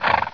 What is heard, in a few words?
horse; wanted